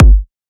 kick oneshot light; GhostHack

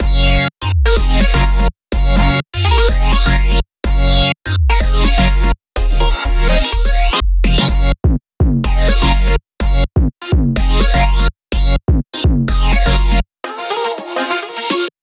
C# Maj 125 BPM, Melody Loops; Rainbow Road; Cymatics

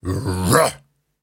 Grunts and Chants, Viking Vocal

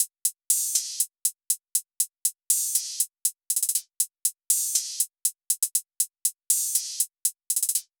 Hihat Loop 120 BPM; Charged; Hip Hop

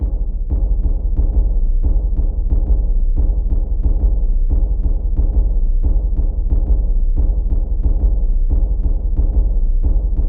Lowdrum - 90BPM; Percussion Kits; STEMS; Ghosthack; SkyRealm; Kit 10 - Peace Treaty

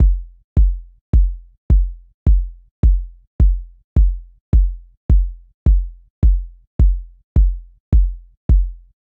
Drum Loop - 106 BPM Kick, Afrobeats; PARADOX II - Drum Loop Collection; Cymatics; Tribute Drum Loop

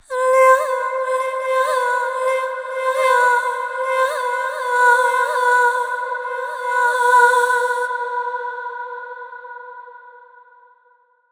Granular Vocals - Repeater - Cm 60Bpm; Granular Vocals FX; Ghosthack; SkyRealm

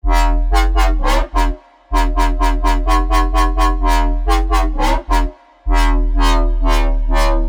Bass House Loop 128 BPM E; Synths; Loops; Drop Loops; Cymatics; EDM Starter Pack

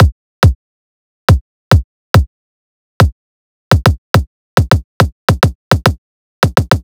Riddim Drum Loop 140 Kick

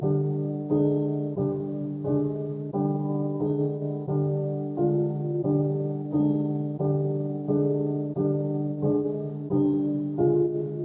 Dark Melody Loop 177 BPM F Min Chords; Melodies; Loop Stems; Cymatics; Oracle Sample Pack

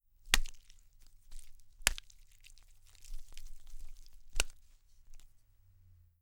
Cold Cut Slaps, Squishy; Fairlight Sound Lib

